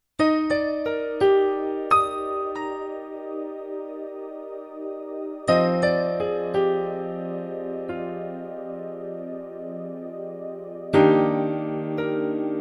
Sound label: Roland FP-30X Piano Pad